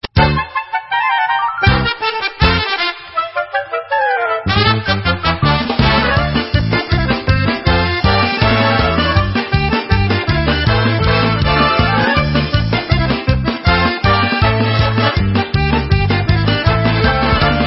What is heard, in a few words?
Hora, Instrumental